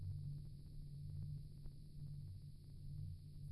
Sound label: loop Hover; loops; Mindustry